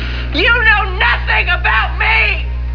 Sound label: scream